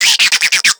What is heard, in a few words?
Infested Minion Bark; Warframe